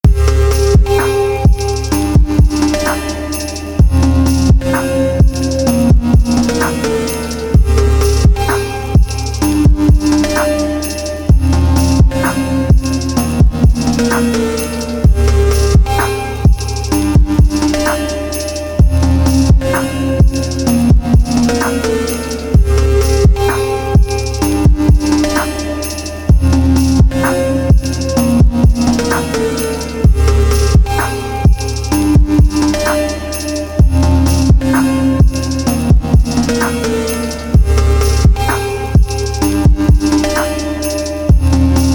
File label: phonk dash